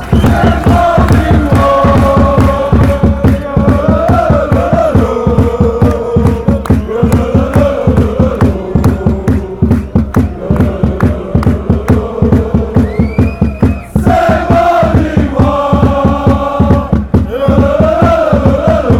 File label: chant, fanchants; mechelen